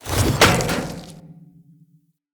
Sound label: Pot Smash; Cinematics; Bromius; Bromius Quest Sap Drink Memory